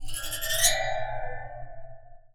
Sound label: Waterphone Multiple Hit Rattle With Water Movement Contact Mic; The Sound Catcher; Liquid Metal